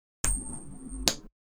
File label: Coin Flip